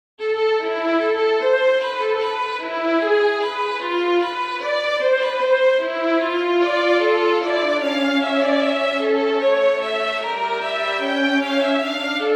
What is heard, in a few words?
strings; unyo castle